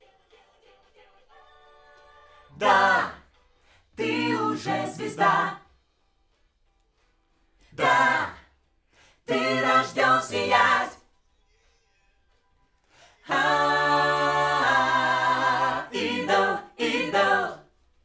Backs AKG

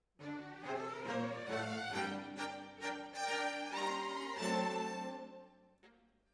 Decca, Jazz for piano

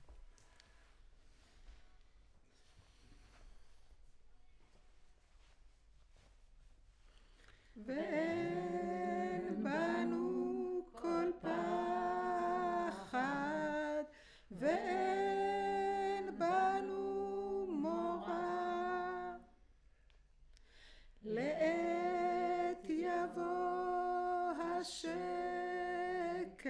Choir

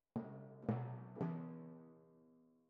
Timp Close

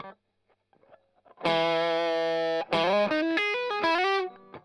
Strum Line

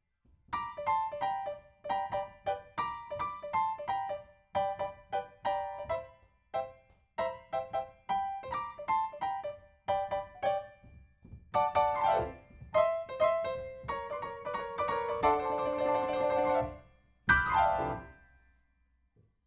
PIANO

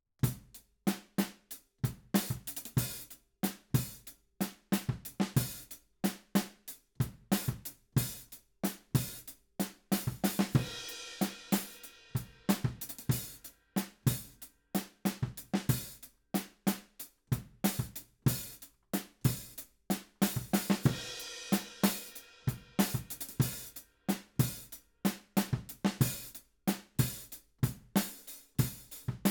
Ride

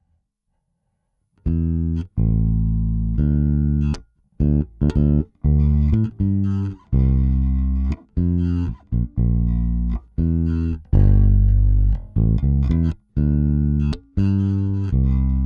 Bass

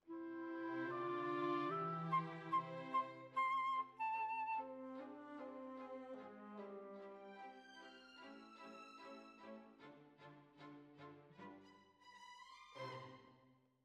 Flute

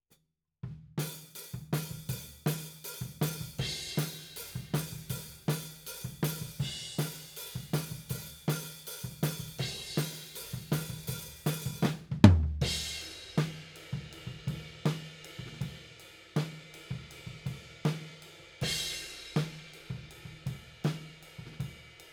Tom, 160 BPM

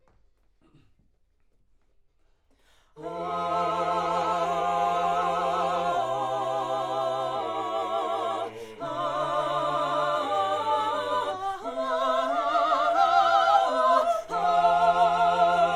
Backs AKG LEFT

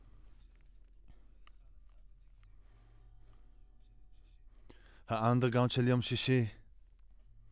Voc OD

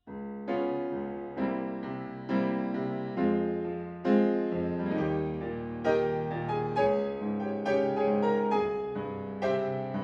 Piano Room

